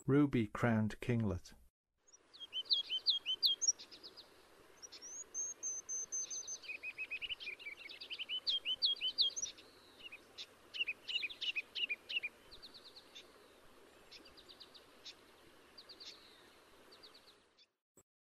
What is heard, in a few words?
Ruby-Crowned Kinglet, Songs of Birds of North America; Western USA; Western USA Songbirds